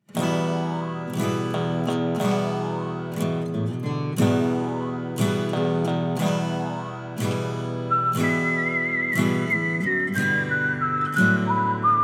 Acoustic; Shadow

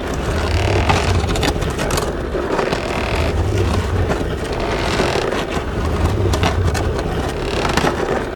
Waterwheel; 2011 pc gamerip; Dungeon Defenders

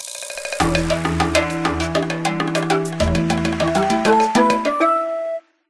task completed; Karamja Diary - medium

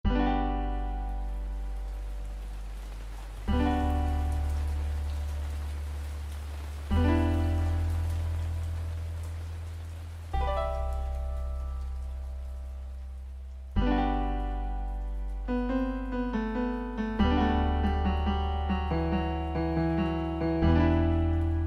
Lofi Remix; Eterna Forest; Pokémon DPPt

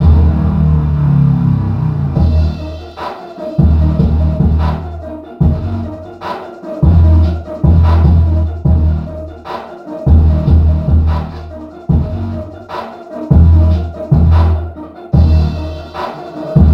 Radio Outside; Crackhouse Radio 2

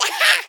rat victory; Rats

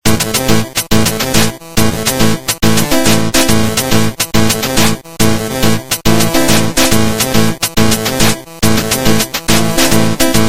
music; Dash Quest; android

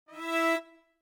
Puzzle Blow; Scarlet Hood and the Wicked Wood

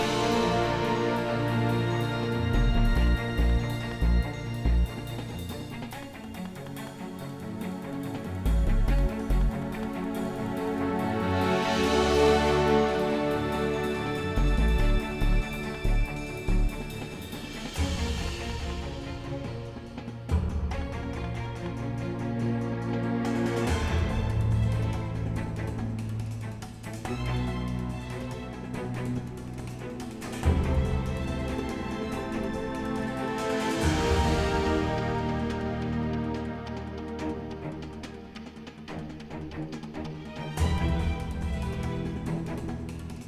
Action G#2; android; gamerip; the amazing spider man

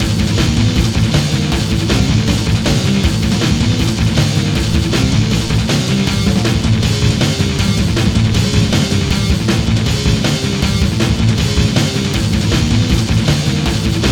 Red Tree Burst

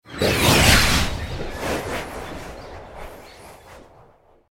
screeching horror whoosh; Voices; Massive Cleavage vs. Zombies Awesome Edition

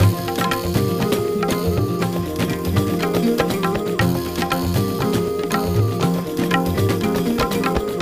percs oud; music world

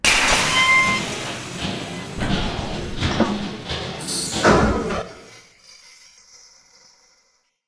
Car Compacting Reopen

